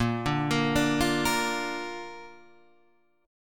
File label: A sharp Major